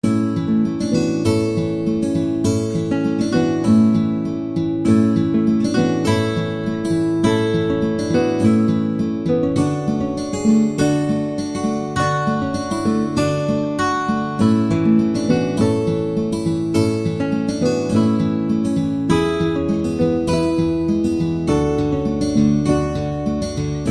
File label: Simple Folk